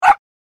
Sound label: Small dog single bark